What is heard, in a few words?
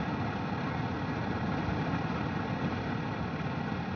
Ambience Loop, outdoor